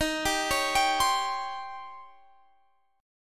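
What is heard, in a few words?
E flat 13th E flat, down, Guitar, Open F; arpeggiated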